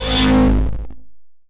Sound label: shield activate; Egypt-Stone-War